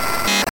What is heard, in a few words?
Snd glitch; Knuckle Sandwich